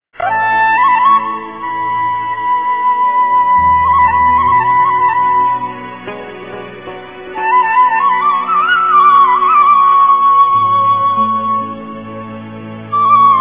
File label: flute, kirtan; pushti